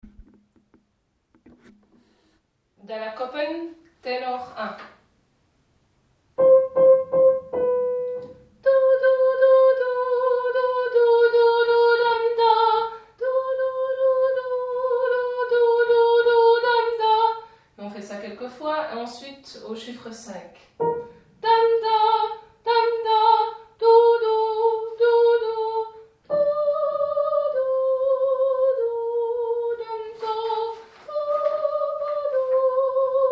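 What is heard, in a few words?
dalakopen ténor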